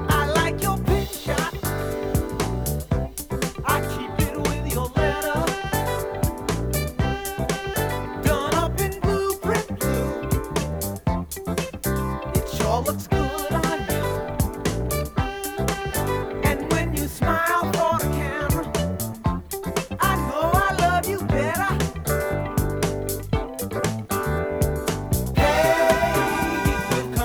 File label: Ion Audio TTUSB05 Turntable